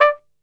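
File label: D2, flugelhorn, brass; Best Service; Brass Super Section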